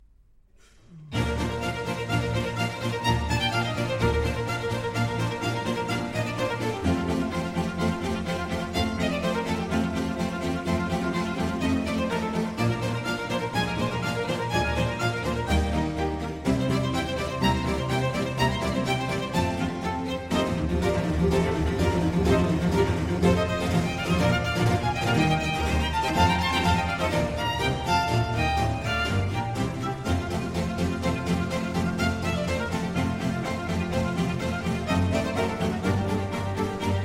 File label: Concerto for Strings in B-Flat Major, Allegro; Vivaldi; Concerto Italiano